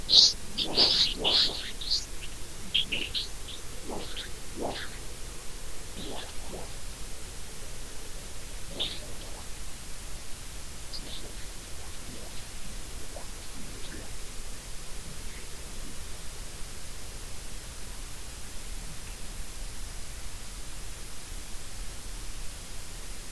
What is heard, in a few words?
WFR audio emfisis